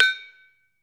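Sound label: HIGH BAR, Metalophones, Pitched Percussion; E-MU Producer Series Vol. 7 – Old World Instruments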